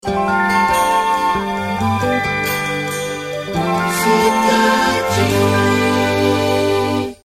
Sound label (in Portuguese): Vinheta; Cidade FM